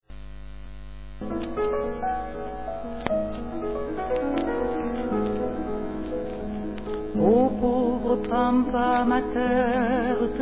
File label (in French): Le chant des ouvriers, Protest songs; Ma discotheque vinyle